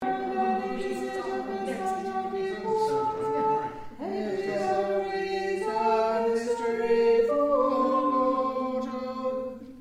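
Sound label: tenor, singers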